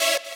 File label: saw